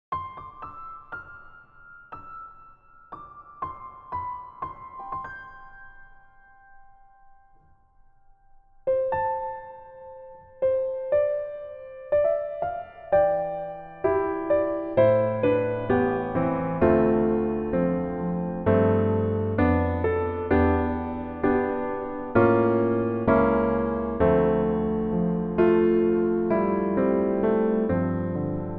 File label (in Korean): Piano ver, 멜로디MR